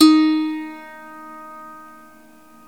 SWEDISH LY, Swedish Lyre; E-MU Producer Series Vol. 2 – More Studio Essentials; Composer

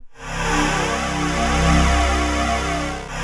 VOLCANIC C1, PROPHET VS; Greytsounds VOL-1

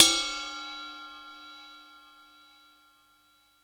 Roland U110 Rock Drums D#2

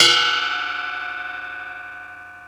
Cymbal Bell One-Shot; Drum n Bass; One-Shots; KJ Sawka; Drum n Bass & Beyond; Drum Tool Box

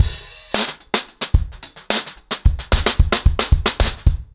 110BPM, acoustic drums, drumloops

